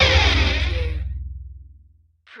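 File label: Production Element Hit Flange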